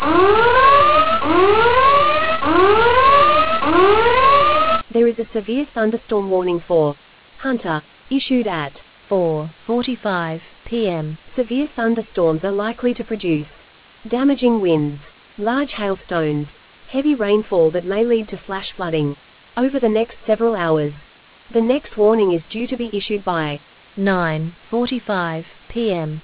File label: storm audio